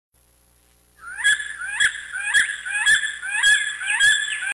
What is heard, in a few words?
Eagle; Jungle